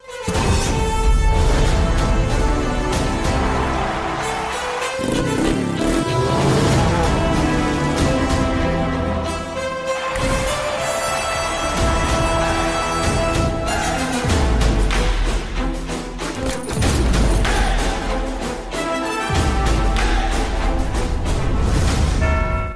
Bgm Ringtone; Bigil; Rayappan

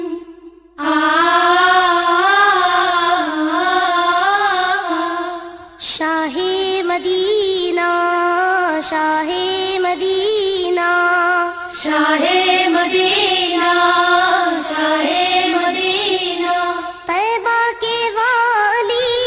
Islamic Naat Ringtone

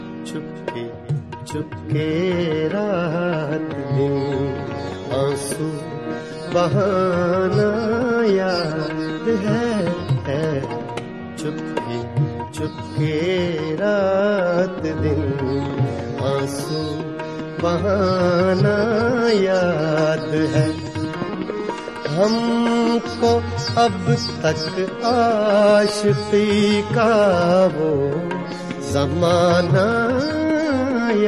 Hindi Sad Ringtone

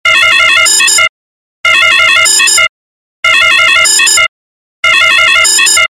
New Alarm Ringtone; ringtones

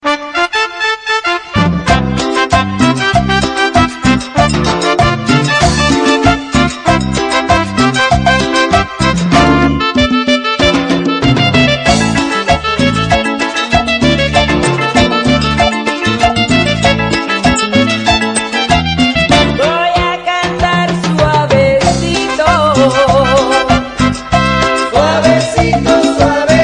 Hollywood Ringtone

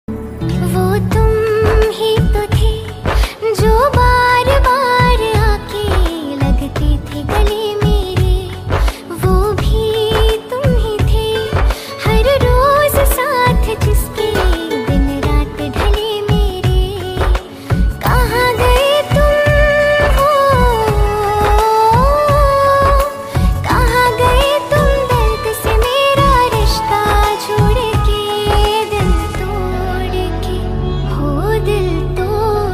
Female Version

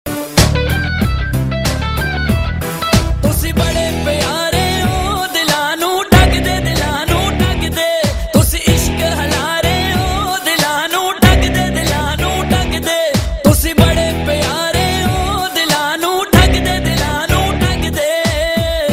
Punjabi Love Ringtone; ringtones

